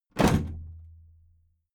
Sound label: big plastic case lid put down heavy